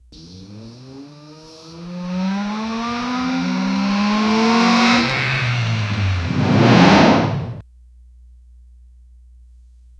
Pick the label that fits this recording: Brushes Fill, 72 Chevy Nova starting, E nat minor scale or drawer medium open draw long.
72 Chevy Nova starting